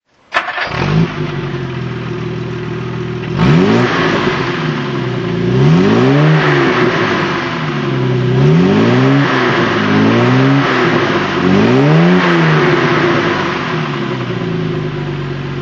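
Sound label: jaguar mk2 34 auto throttle; Jaguar Mk II 3.4 Litre, 1967; Engine sound; Engine sound driving; V3D/ ClassicarImages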